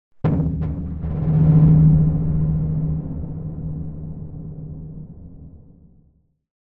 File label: Impact Abstract Timbale Hit - Several Dull Drum Hits, Long; Hollywood Edge; sound designer's toolkit v2